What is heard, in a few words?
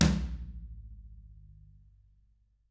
TAMA, Drums